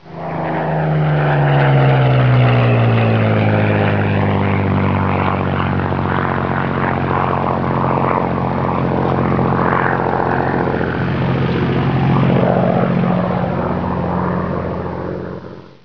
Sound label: Spit twinseater; Spitfire; MKS Fighters